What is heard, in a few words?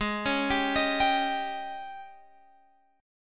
Ab Major 7th Suspended 4th Ab Guitar Open G; down